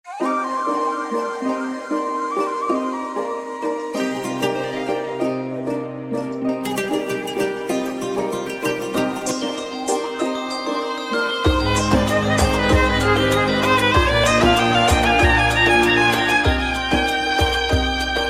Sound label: Arab